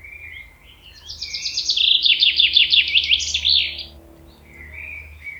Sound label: chaffinch song; Chaffinch (Zięba)